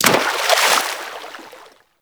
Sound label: Melee Impact Water